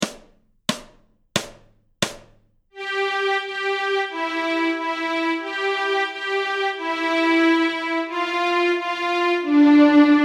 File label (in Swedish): Lilla snigel Stråk C, Fiol